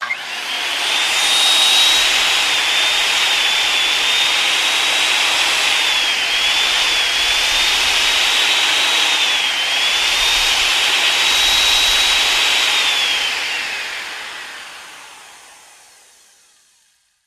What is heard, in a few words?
Electric Saw Steady